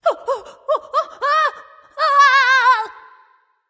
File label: fear; Smite; athena